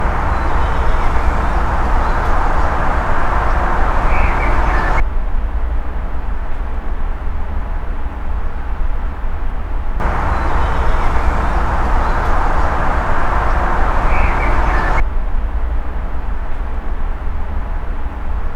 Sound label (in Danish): 5sek uden og 5sek med stojhegn gentaget 5 gange; Oktobervej